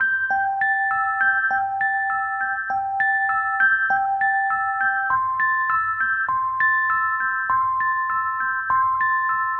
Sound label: Low Tide 100 C Electric Piano, Ambient Piano Loops; C and Dm Night Mood